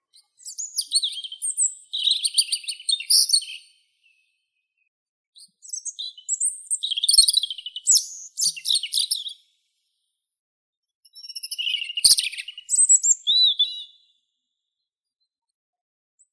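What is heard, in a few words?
robin Erithacus rubecula, nature sounds; malinovka zaryanka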